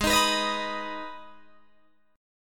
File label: G sharp Minor G sharp down Guitar Open D, chord